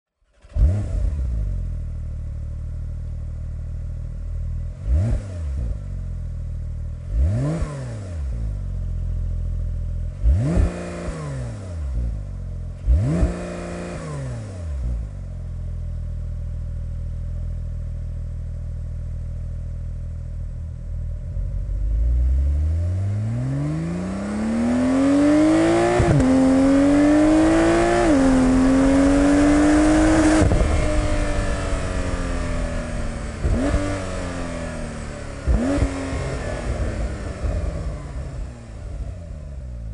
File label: Stock Exhaust Porsche 911 Turbo 992